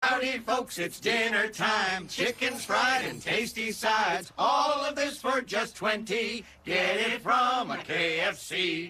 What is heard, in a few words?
caroling; KFC